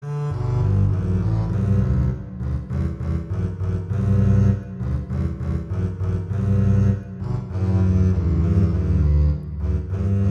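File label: Duet, horn; Mozart Duets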